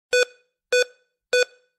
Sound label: bip scan